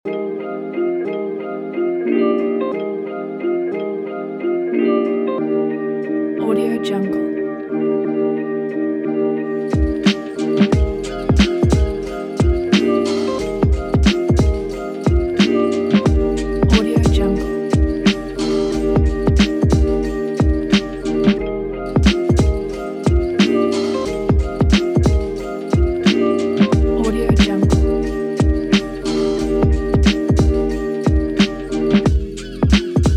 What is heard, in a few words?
boombap